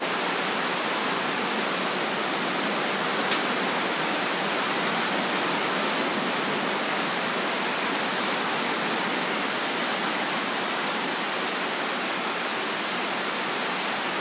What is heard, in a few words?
Jungle Rain